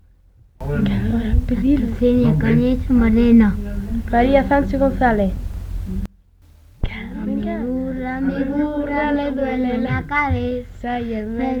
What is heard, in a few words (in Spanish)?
andalucia, a mi burro